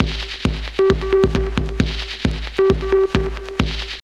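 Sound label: drum loop; X-Static Goldmine 3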